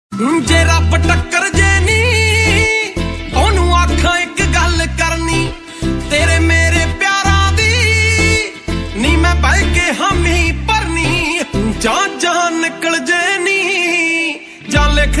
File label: Punjabi Song